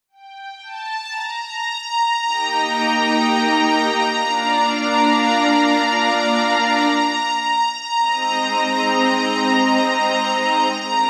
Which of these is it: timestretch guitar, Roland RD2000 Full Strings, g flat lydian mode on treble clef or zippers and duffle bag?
Roland RD2000 Full Strings